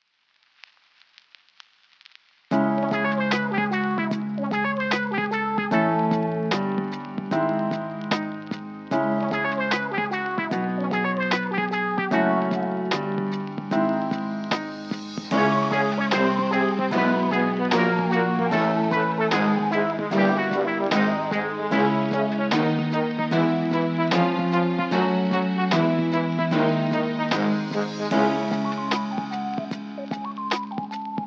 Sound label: CREDITS (Instrumental); Friday Night Funkin Labyrinth Bite; FNAF 6 Mix; THATS A WRAP (Re-Opened)